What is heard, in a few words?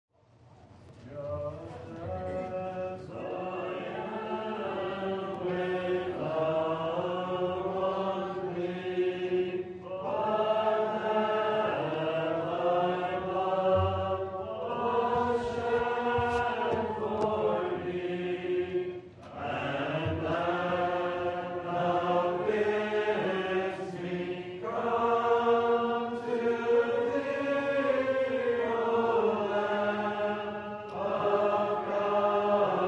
Hymn, Gospel Hall; 2015Conference